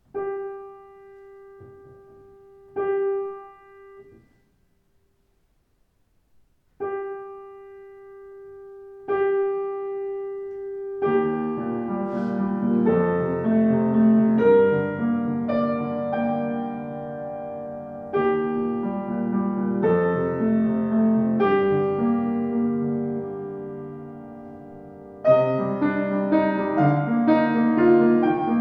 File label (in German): Schimmel Klang, Klavier; schimmel 106 eiche